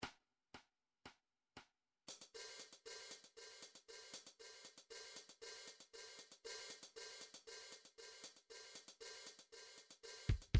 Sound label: world soukous, midi; sonar